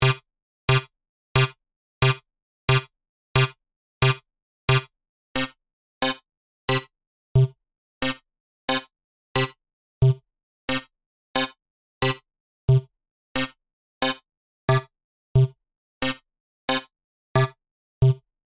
plus velocity, sequencer; adjust the velocity of the four currently audible steps; Numerology; Five12

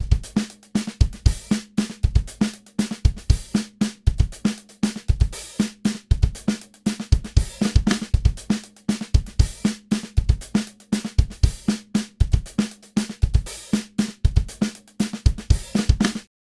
Drum loop dry

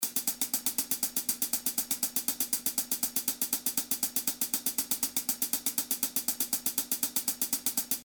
HH with constant velocity (100); HH with random velocity (75-90)

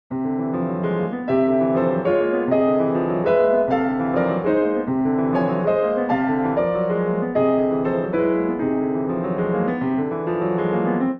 steinway D

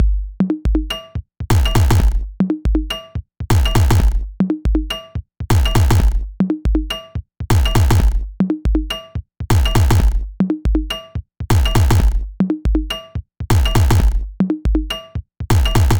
Electronic Drum loop dry

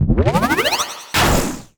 Start Stop Beam; Tsugi

